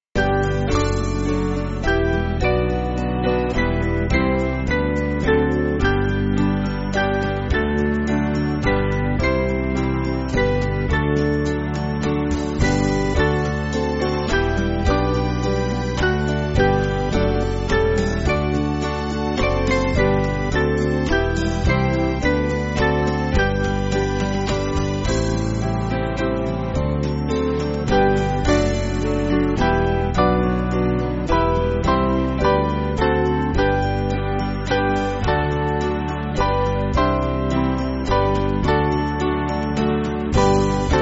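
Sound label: Band, Backing Files for Churches; When God Of Old; Stroudwater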